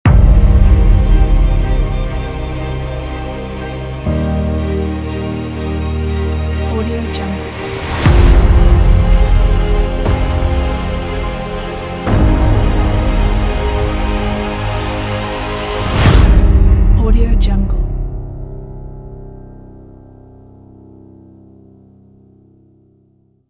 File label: epic logo